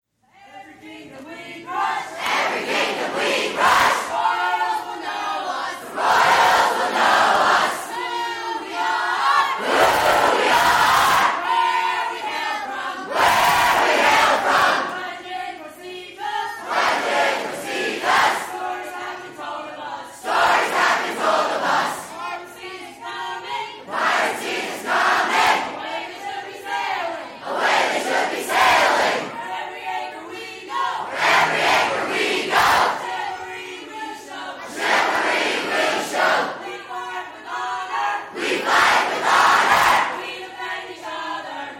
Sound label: Medieval Cheer